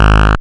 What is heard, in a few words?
boink